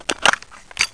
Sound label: BUCKLE; Duke Nukem 3D Kill-A-Ton Collection